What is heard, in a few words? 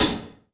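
Cricket Oneshot, beats; Sonic Foundry (Sony Creative Software); Esoterik Beatz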